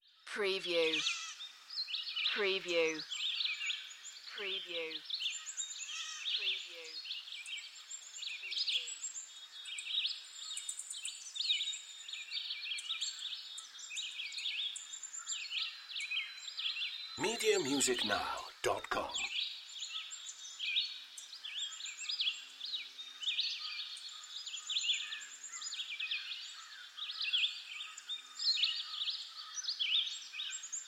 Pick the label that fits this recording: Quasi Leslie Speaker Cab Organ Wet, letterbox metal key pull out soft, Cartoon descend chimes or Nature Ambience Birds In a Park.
Nature Ambience Birds In a Park